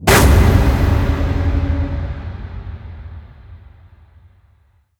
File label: Ice Spell Barrier Defense Crystal Armor; Arctic Aura